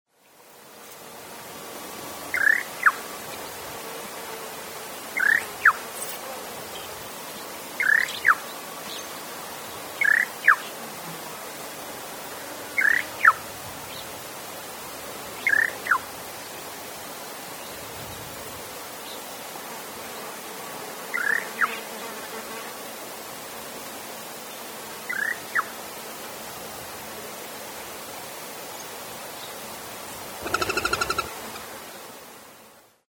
Palomita Cordillerana, Metriopelia melanoptera, Black-winged ground-dove; cantos